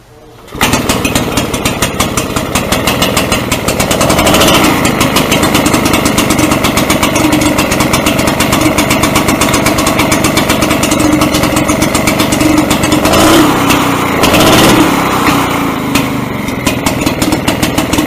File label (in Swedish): motorcykel cleveland 1917; arkiv hjalmar